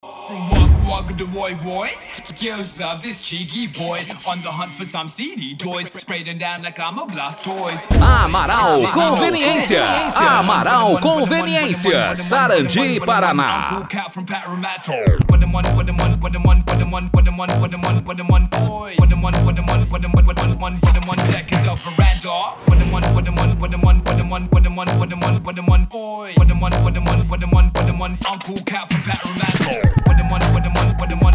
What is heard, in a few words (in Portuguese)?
funk e sertanejo; amaral conveniencia; sarandi pr